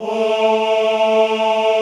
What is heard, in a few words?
OHH A2, Classical Choir; Best Service; Extended Classical Choir